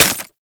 Melee Impact Glass; Warframe; Weapons

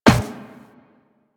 Walk Metal Perforated, footsteps

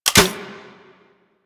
Goo Gun Mech

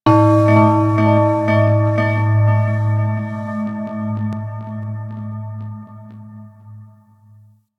Temple Low Bells; Ambience; Warframe; Corpus Ship Remaster

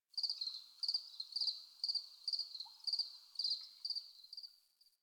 tree critters; Warframe; Duviri

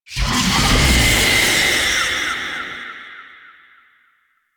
Trapped Chest Open; Duviri; Minigame UI

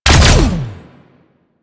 Conduit Fire; Sentient

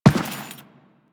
Step Default, Footsteps; Warframe; Teshin

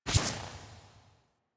UI Theme Sweetener One; Zephyr; Warframe

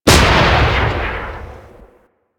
Mortar Fire C, Rocket Swarm Launcher; Warframe; Ambience; Eidolon Plains; Grineer Emplacements

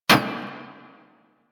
Weapon Hit Effect Metal

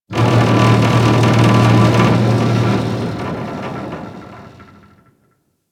Corpus Ship Rattle; Ambience; Warframe; Corpus Ship Remaster

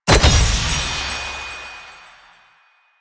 Offering Pickup; Warframe; Cetus; Cetus Invasion

